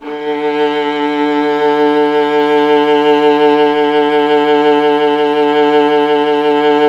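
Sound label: STR VLA D, strings viola; Miroslav Vitous; Solo Instruments VOL-1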